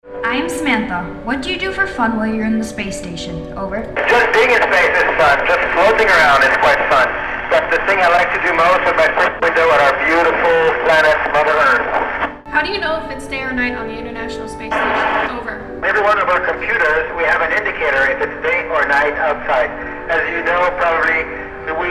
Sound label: Space Station; Pembroke